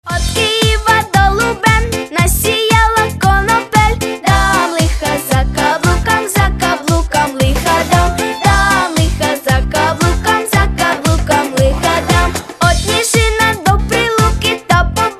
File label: Karaoke